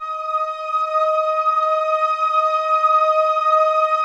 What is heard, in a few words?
PROPHET VS, Vintage Keyboards